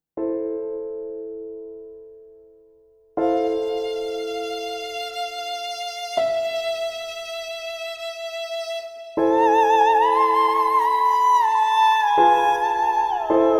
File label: Sad Church; Foundry; FoundryVTT; sr5 Dawn Of The Artefact; Ambiance